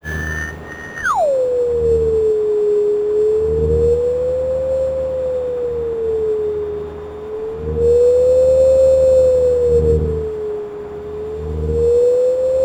modulation long signal tuning, Signal Communication; Bluezone Corporation